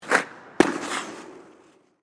Ice Skates Jump; Blastwave

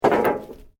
Metal Sheet Move; Material components to create more complex sounds; Materials

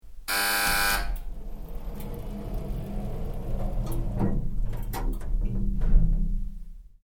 LIFT FACTORY OLD DOOR CLOSE BUZZER; Various lifts operating; Elevators; Machines

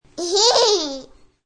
Tdllr Giggles, A toddler giggles.; Funny Sounds; Comedy

